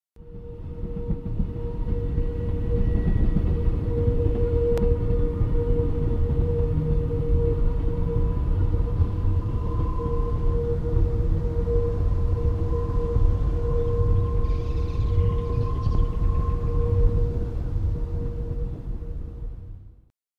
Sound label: merri birds train; Provenance 2007